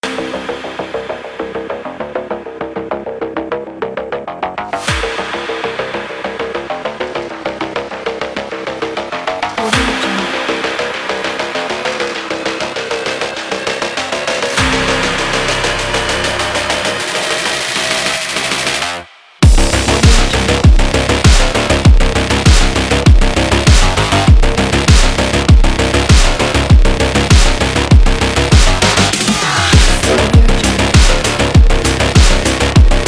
aggressive cyberpunk

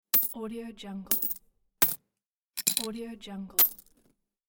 real coins for banks

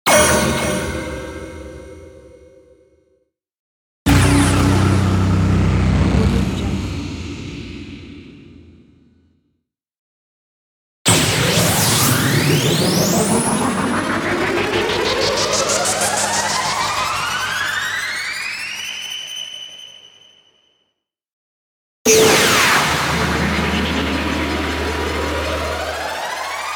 trailers impact sound set

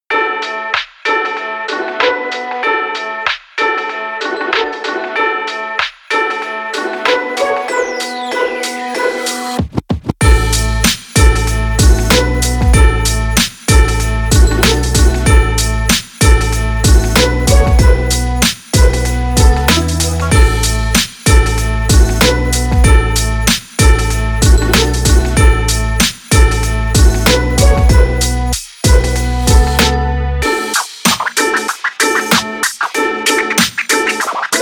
christmas hiphop

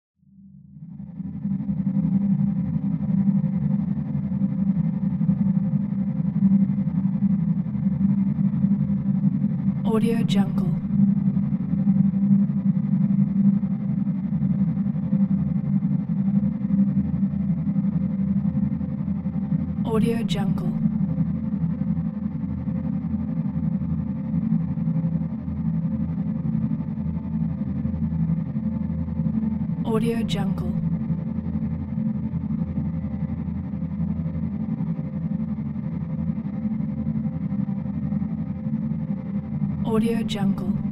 breath pad

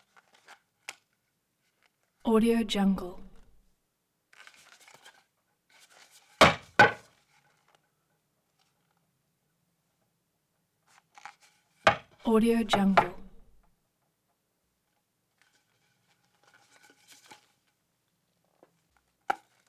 wood movement knocking handle taps scrunch; prosoundfx

